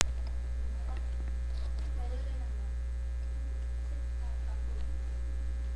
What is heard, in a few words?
knock